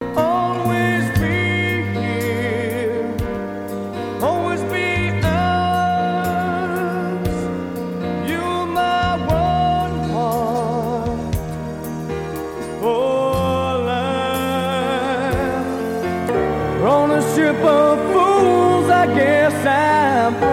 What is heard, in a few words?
Music